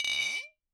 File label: Bottle Struck